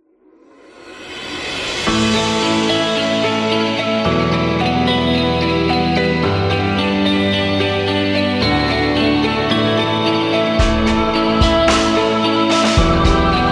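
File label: Indie Inspiring, Corporate background music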